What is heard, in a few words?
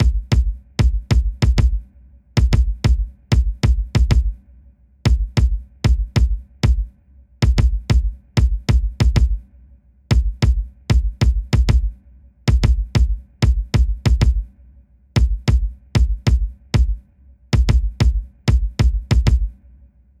Secondary Kick (half); super crush k.o.